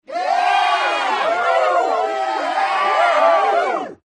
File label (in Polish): aplauz krotki; shockwave; fixitupkatesadventure